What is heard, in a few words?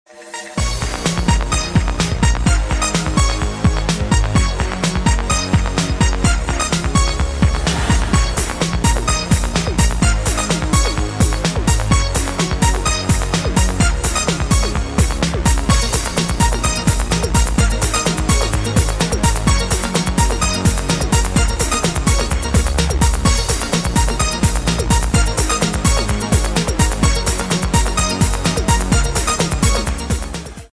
tango